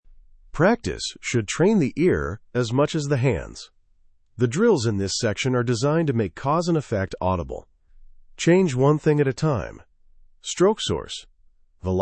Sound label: timpani tone guided companion track, timpani stroke and tone